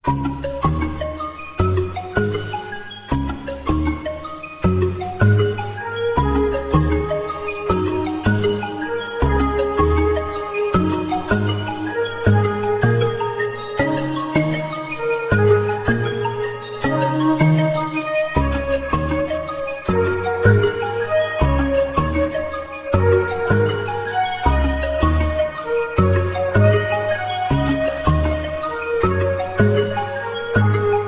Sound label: cafe music